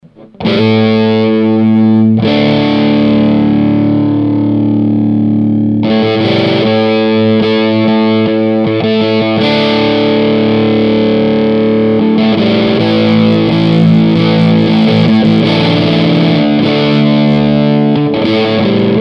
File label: Fuzz Face, guitare